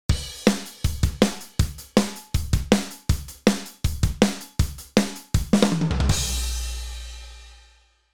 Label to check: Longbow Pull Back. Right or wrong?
Wrong